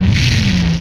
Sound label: Rev, Retro Arcade Sounds, Vehicles